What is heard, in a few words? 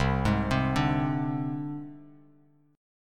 C Suspended 2nd Sharp 5th, down, Bass Standard